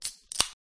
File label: beer can opening